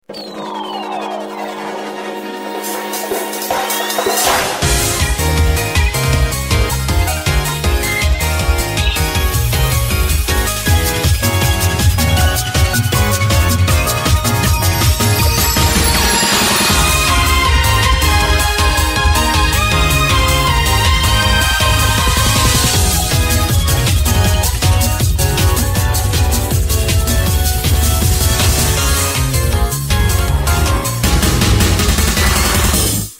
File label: transformation ost no vocals; magi majo pures